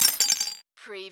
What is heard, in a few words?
CARTOON CRASH BROKEN